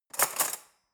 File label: cutlery fork drawer handle